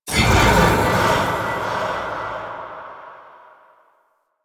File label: Sword Parry Stun; Warframe; Duviri; Dax; weapons